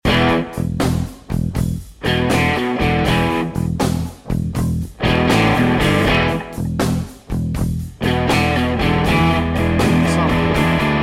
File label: rock n roll remix